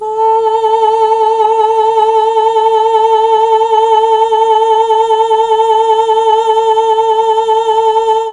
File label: A2 FEMALE, mellotron; CHMB FEMALE